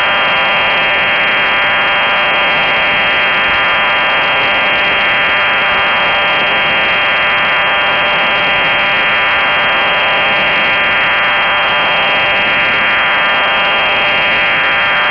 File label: mfsk 12; iarums